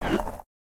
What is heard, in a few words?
Dash Stop; Caffiend